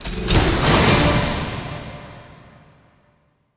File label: case reveal legendary; Counter Strike Model Pack; CS:GO; panorama